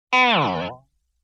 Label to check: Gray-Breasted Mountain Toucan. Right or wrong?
Wrong